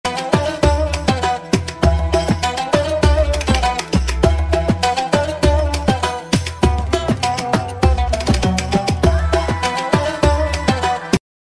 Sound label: hindi ringtone bgm; Pushpa 2; mobile ringtone